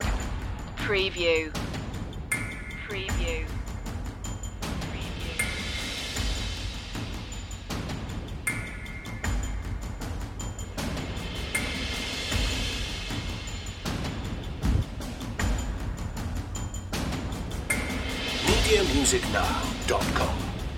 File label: returning home perc